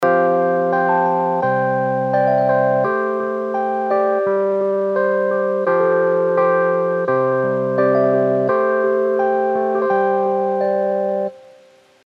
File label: Stage-73 V2 Tape MELLO-FI Noisy Tape; Arturia